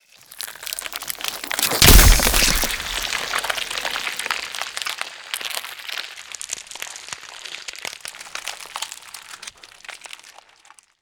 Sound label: Poison Inject; Sacrifice Memory Four